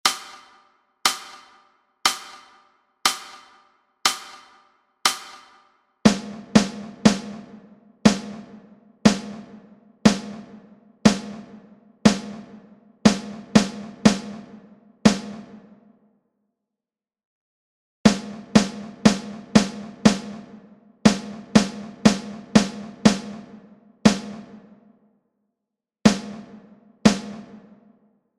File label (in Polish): dyktando rytmiczne; lekcja 08; klasa 3